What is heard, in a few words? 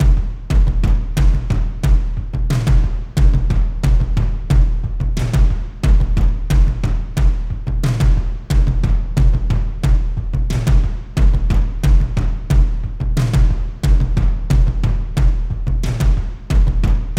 Toms - 90BPM; Percussion Kits; STEMS; Ghosthack; SkyRealm; Kit 14 - Wrecking Force